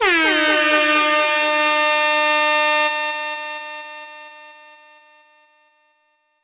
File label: HORN; rekordbox; OSC SAMPLER; PRESET ONESHOT